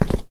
Tile long

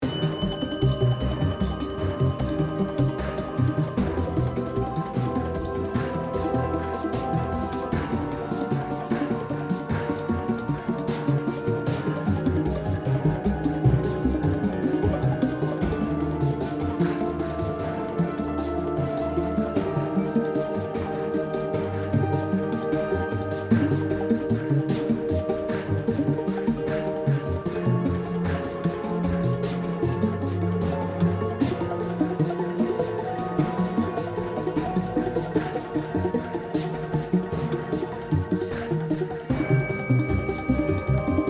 Action Loop

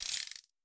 minigame gems rotate

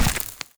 warrior walk stone

